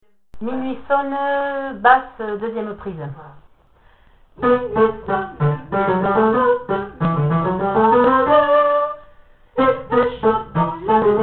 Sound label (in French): Basse; Minuit Sonne